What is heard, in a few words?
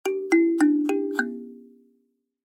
Kalimba Discord; noise creations